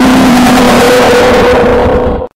jumpscare

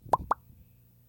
Bomb; facebook

